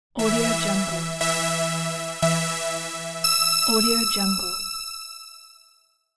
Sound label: game start countdown single; medusamusiclab